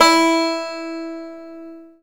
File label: Banjo E3; CMI